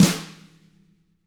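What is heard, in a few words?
TAM FLAM, DW TAMA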